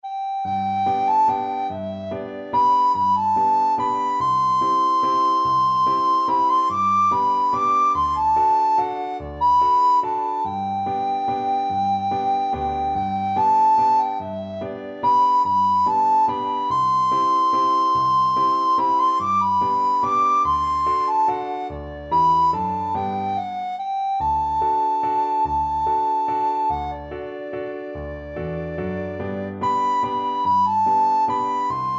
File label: wild rover, recorder